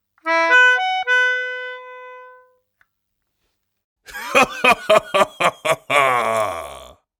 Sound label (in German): Gelaechter